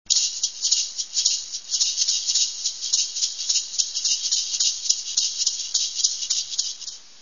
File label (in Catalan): maracas